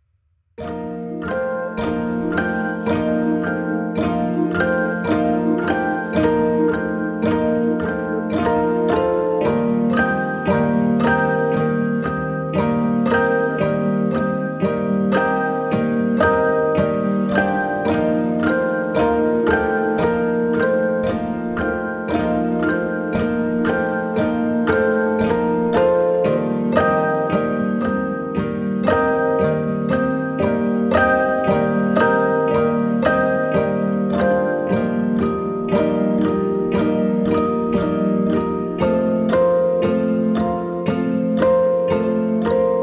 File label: piano pizzicato